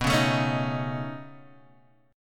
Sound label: C sharp 7th Suspended 2nd Sharp 5th B